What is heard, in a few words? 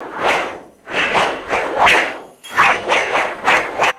fast metallic flying whiz bys